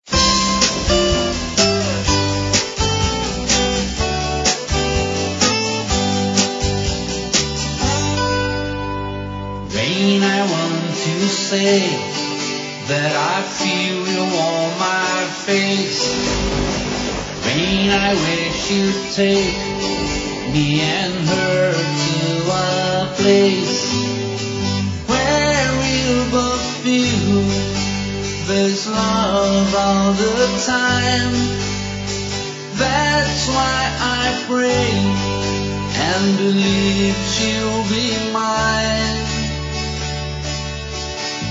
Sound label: Rain Rare Acoustic Version